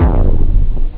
distort, drums technobd

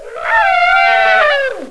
wyvern

